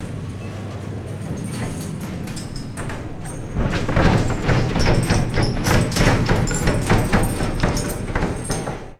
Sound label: heavy wooden elevator door shaking; machines